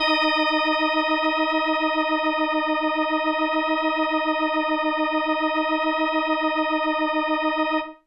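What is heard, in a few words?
Indie Organ Kawaii D#1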